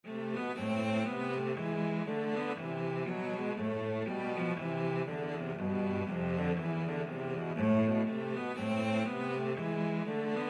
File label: bach bouree, cello trio